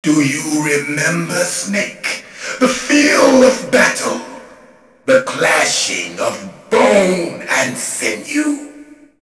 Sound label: feel of battle; mgs; greyfox